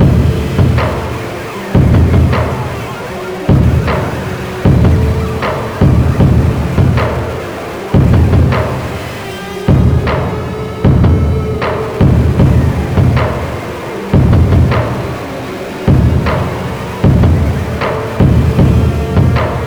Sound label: Intro Loading Loop